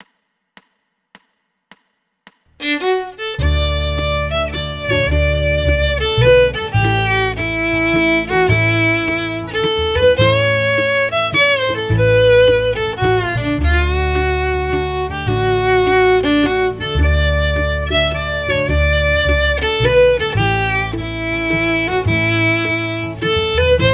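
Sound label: fiddle, waltz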